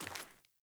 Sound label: Sandals Walk Rock, Footsteps; Characters; Player